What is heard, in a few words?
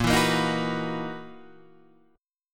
F sharp 13th A sharp